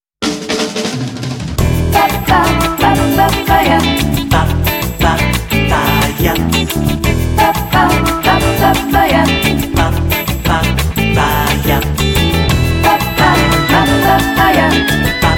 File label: Upbeat